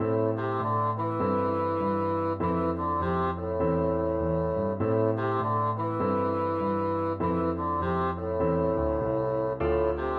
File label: bassoon